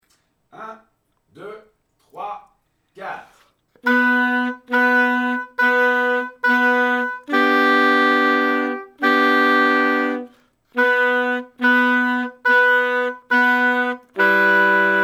trio, saxophone

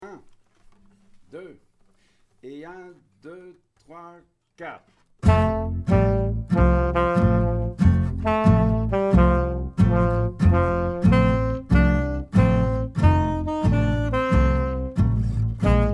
lets begin sax

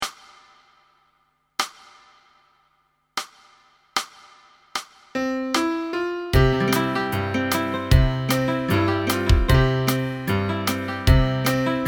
saints solo, basson

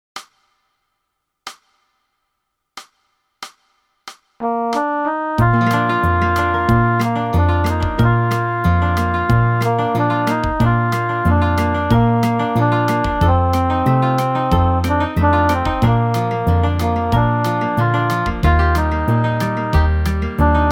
saxophone, When the Saints

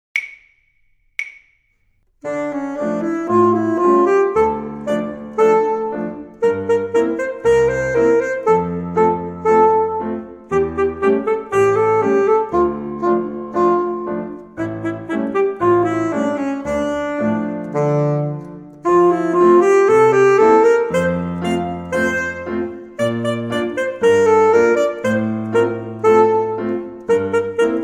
parade sax

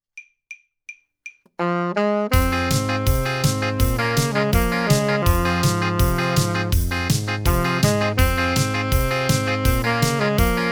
sax

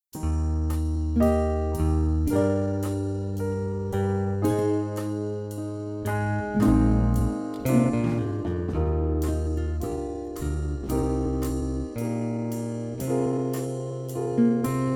saxophone; mib

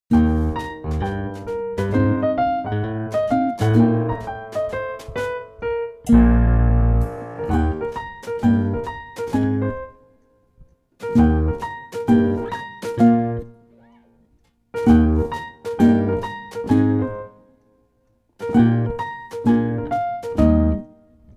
saxophone; mib

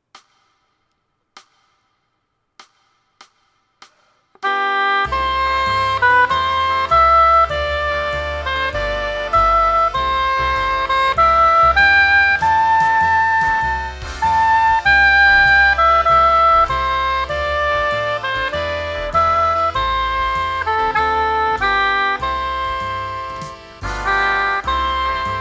auld lang hautbois

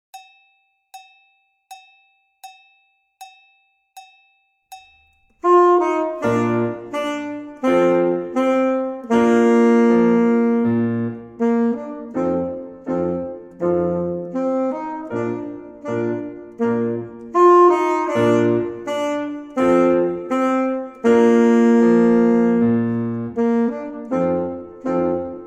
cour du roi sax

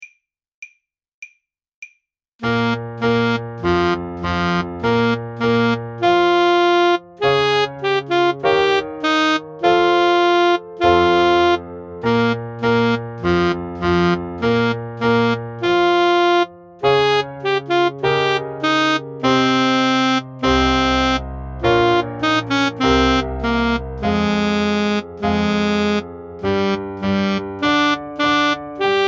cossack dance sax

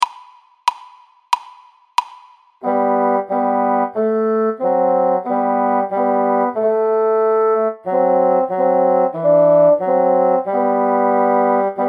gegeneinander duo, basson

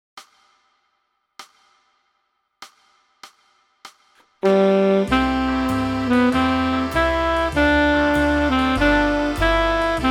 auld lang sax; auld lang syne

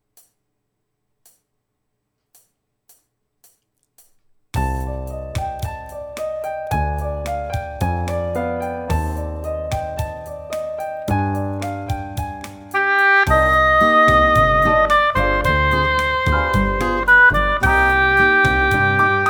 manha hautbois

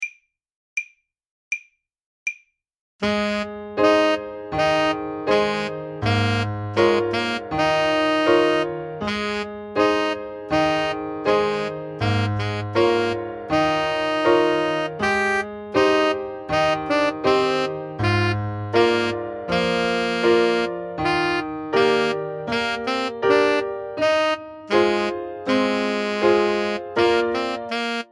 danse clown sax

